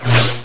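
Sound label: saber swing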